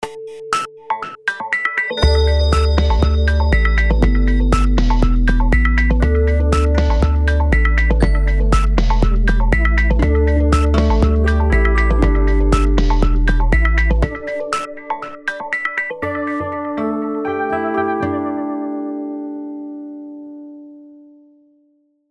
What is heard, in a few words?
good morning jingle